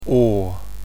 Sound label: phoneme horse